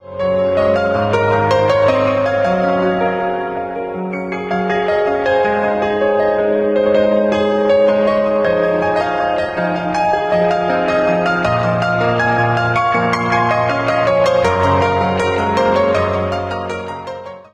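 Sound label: background music crossfade; Invaxion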